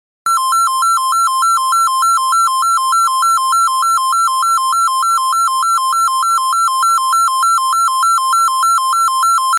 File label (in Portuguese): Sirene